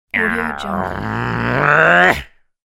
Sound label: tricky gnome furious anger